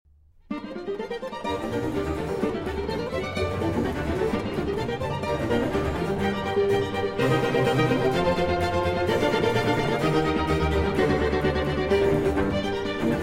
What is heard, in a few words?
Concerto for Guitar and Strings in G minor; Vivaldi; Op. 10, No. 2, R.439